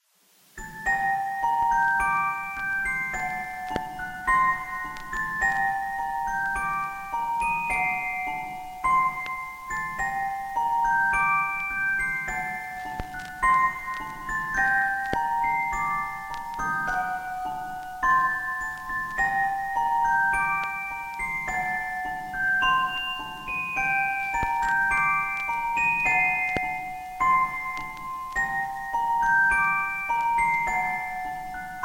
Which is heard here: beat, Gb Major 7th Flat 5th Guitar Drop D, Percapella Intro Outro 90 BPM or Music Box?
Music Box